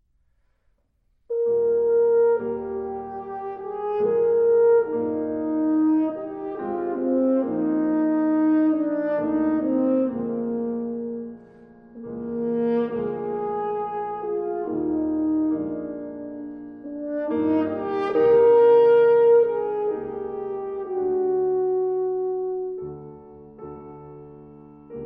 Sextet In B-Flat Major, Larghetto

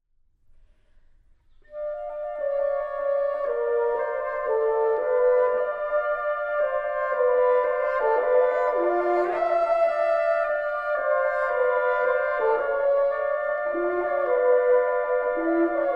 Quintet for Winds

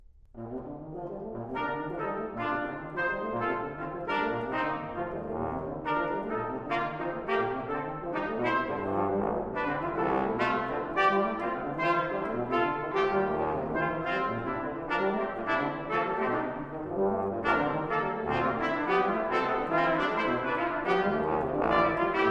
Brass Quintet, Fast and lively

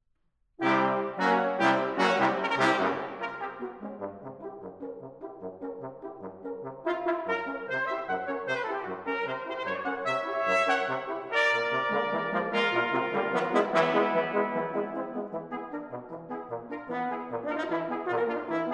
Brass Quintet, Entry; Brass Quintet No. 1, 'Circus'

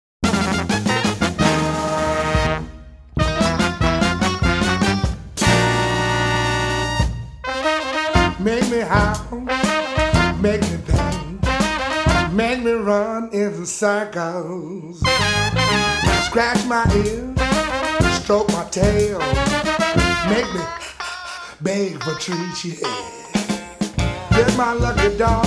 Blues, New Orleans Trumpet

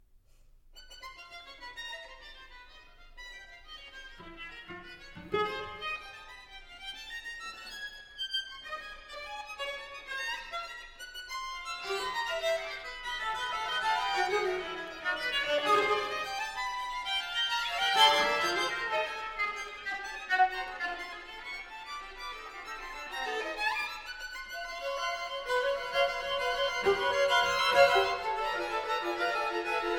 String Quintet, Presto possible

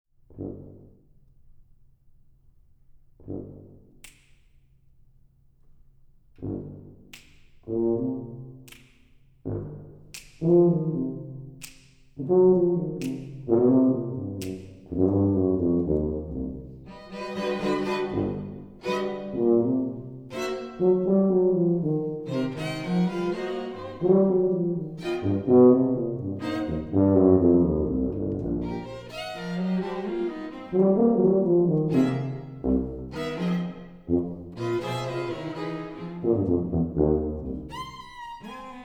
Blues

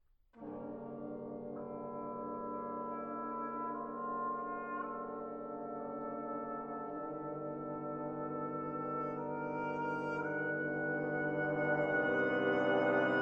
Brass Quintet, Arioso

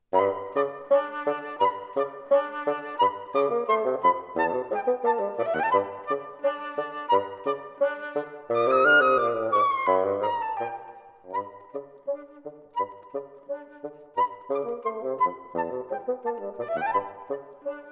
Petite Suite For Flute & Bassoon, Burlesque

